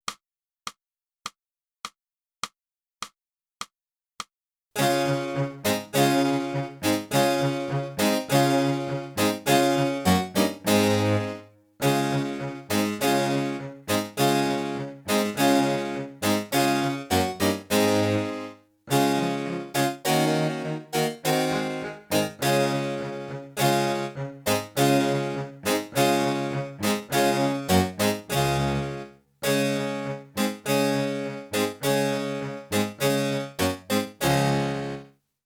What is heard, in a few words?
sax